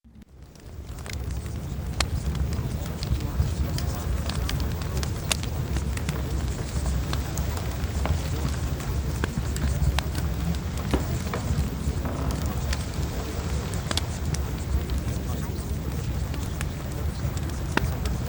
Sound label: Fireplace; BUSINESS SUCCESS